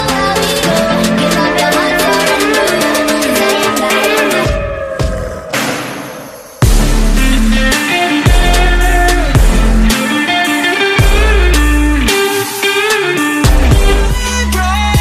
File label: latest beat ringtone